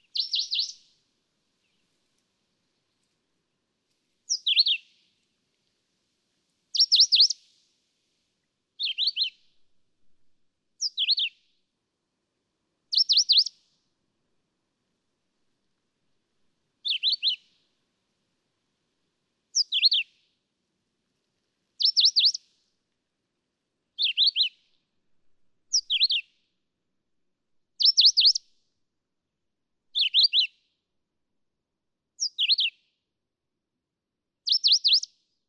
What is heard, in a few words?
Philadelphia Vireo